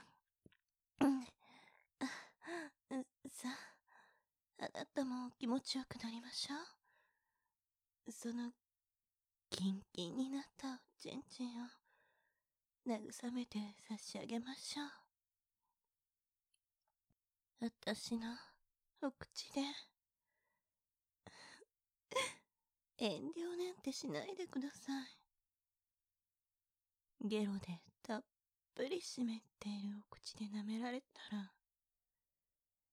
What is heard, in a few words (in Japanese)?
えづきながらイラマチオ ボイスのみ